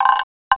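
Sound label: slit drum toot; Musical Instruments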